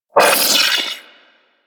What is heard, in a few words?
Sentient Battalyst Vocal Pain; Warframe; Sentients